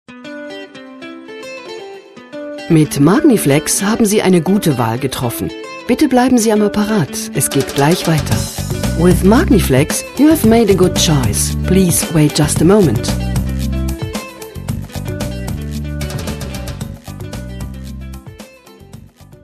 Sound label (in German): warteschleife; magniflex